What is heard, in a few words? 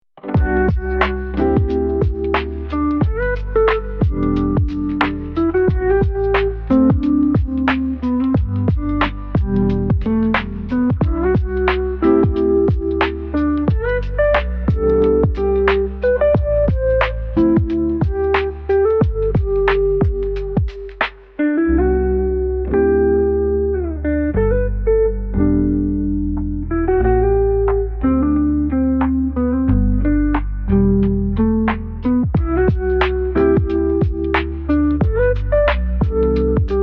soothing waves